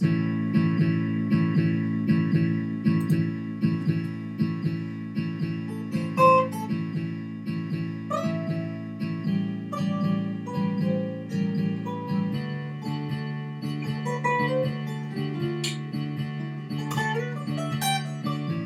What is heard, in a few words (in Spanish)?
Base blues LA, guitarra acustica